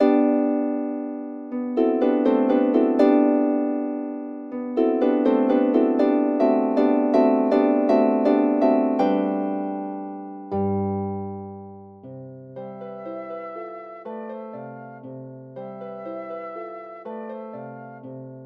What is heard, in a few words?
the flower duet, flute and harp; Wedding Music for Flute and Harp Vol 1